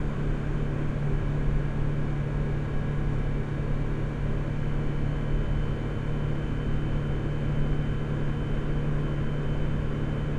Mazda Miata MX5 NBFL 1.6 Stock - INT - Cabin - 4k RPM loop; stereo; Soundholder